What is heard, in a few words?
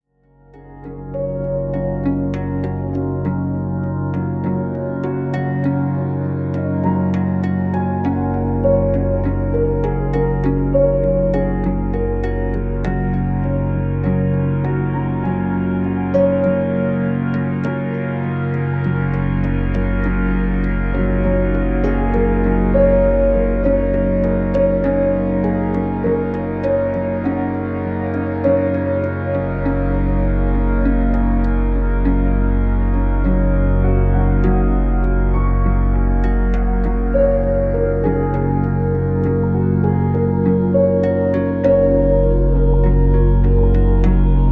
Deep Relaxation 3D RELAX